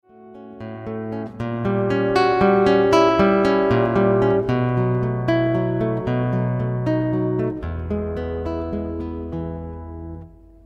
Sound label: andantino, guitar